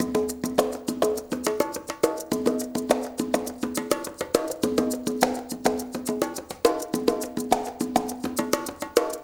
ECHO, COW STIX; Spectrasonics; Liquid Grooves 1 & 2; 104 COWSTIX

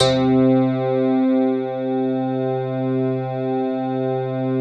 ROCKIN BA; Greytsounds; Scott Peers Sound Engineering

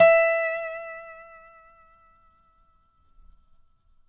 DOBSUSE5 -L, dobro notes; Country

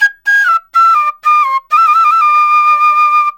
SERULING, Heart of Asia; Spectrasonics; SERULING PH

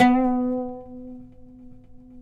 OUD B; Guitars; Spectrasonics; Hans Zimmer Guitars VOL-2

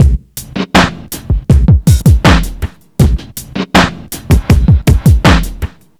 Street Tip, R&B Drums

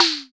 snare mod

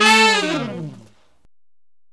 Sax Section Fall in A, Jazz; Traditional; Sonic Foundry (Sony Creative Software); Jazz Solos and Sections

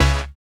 SYN, dance; Sound & Vision - Gigapack II CD 1

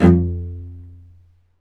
STR, Vc Marcato; Roland L-CD702; Vc Marc&Harm; Vc Marcato 1

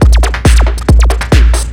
drm 138, Techno, drum-loops; Techno ID; modular-fiction

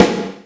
AMBIENT SD; Big Fish Audio; Synth City

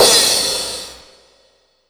PROCYMB, CYMBALS

